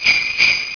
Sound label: Jnglbell